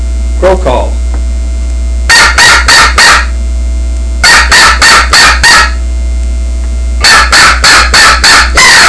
crow call; Southland game calls

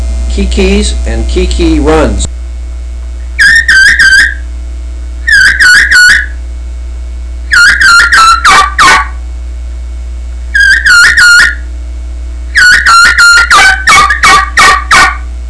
reliable kee kee; Southland

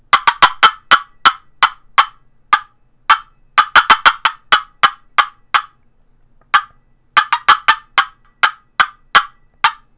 golden deluxe poplar custom box call cutts; Southland Sounds

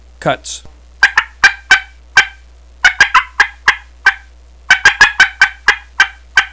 cutts

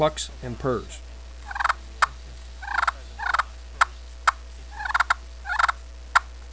slate clucks purrs, turkey hunting

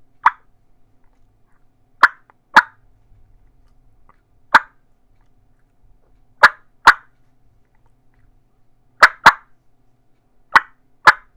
clucks; batwing III; Southland Sounds

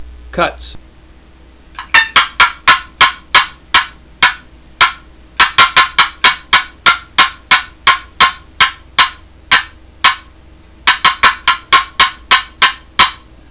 walnut cutts, turkey; dixie

